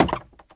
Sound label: wood step; Golden Crayon Sounds